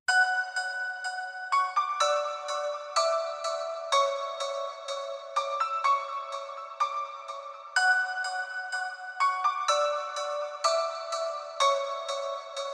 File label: Remix Instrumental